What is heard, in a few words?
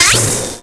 Magic